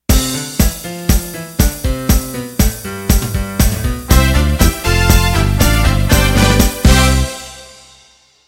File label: Motown End